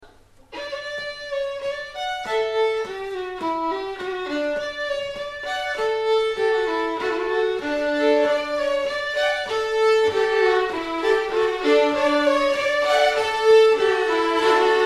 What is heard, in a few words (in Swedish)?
slangpolska; petter dufva